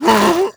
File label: grunt, zombie; creatures